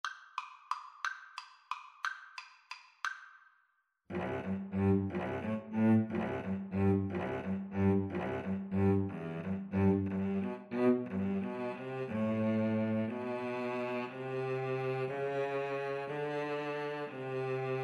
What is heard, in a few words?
Cello Duet